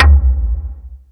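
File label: high tom, drumtraks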